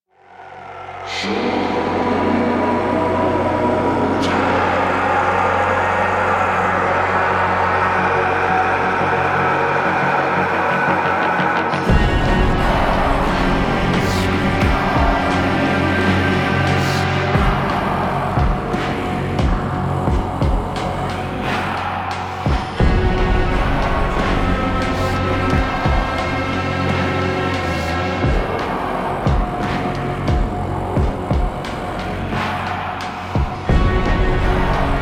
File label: Epilogue Cue, Music; Warframe; DE; Entrati; TennoCon2023